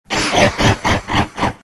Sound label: golem laugh; Warlock golem